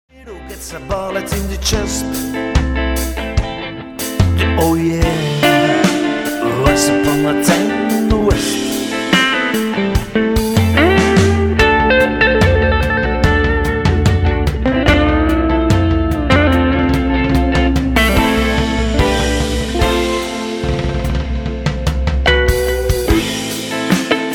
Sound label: No Bass